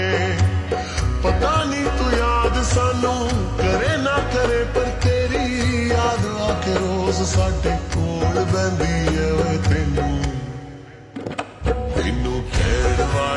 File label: Ringtone Slow Reverb